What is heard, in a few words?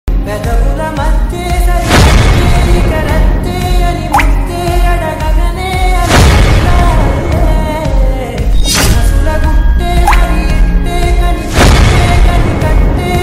Telugu Ringtone